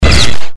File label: good pain; CAC ASSETS